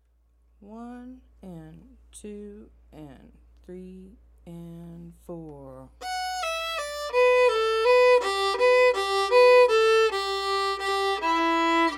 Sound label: Slow Fiddle